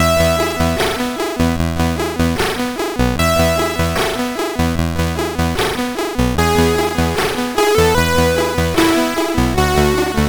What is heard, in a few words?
loader sid CSG8580R5; Vikings